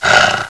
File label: gonome pain; svencoop